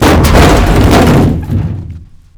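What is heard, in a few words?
vehicle death wreck crush; WAR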